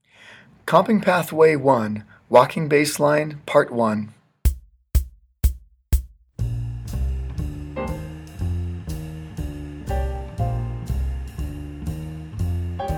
comping walking bass line

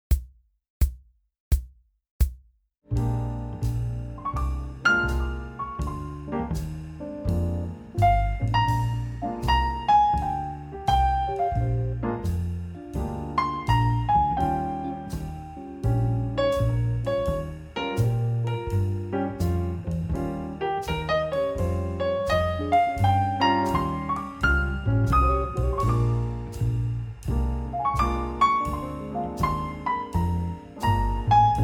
piano I and IV swing; transcribing solos

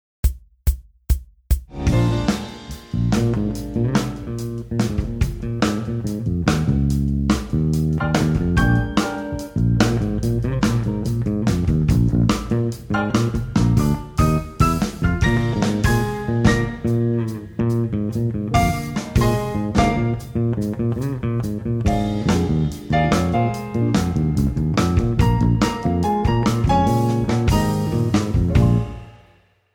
bass I chord rock; transcribing solos

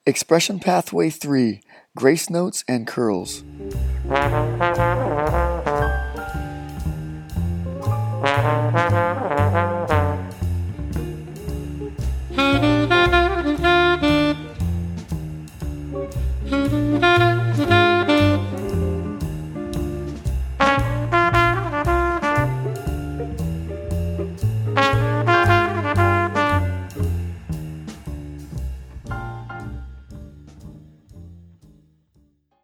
expression grace notes and curls

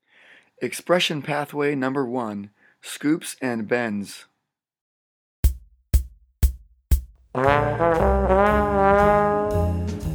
expression scoops and bends

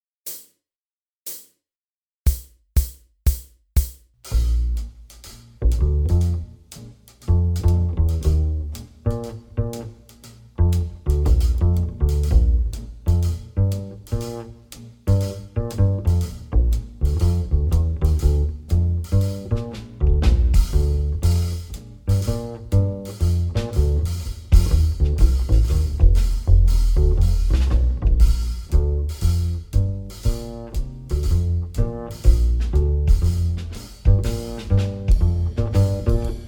solo swing bass

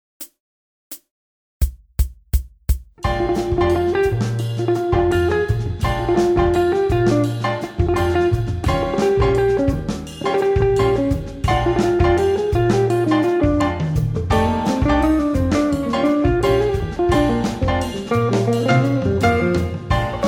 guitar blues samba; transcribing solos

